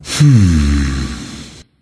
thinking; smite; voicepack; sobek

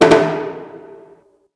fill; smite; god raijin

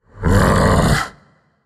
"ugh"; smite; voicepack; set

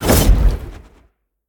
projectile; smite; olorun

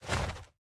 armor; smite; merlin

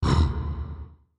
ox grunt; smite; god gilgamesh

